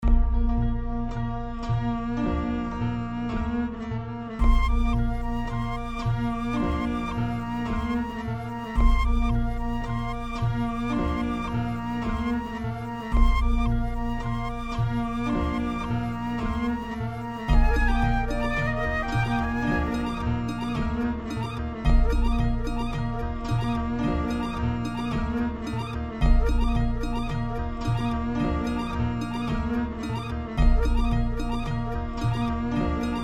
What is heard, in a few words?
instrumental; 2006 glitch instrumentals